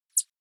tandem chases audible

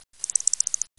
echolocation calls audible

